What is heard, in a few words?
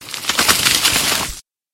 monster ent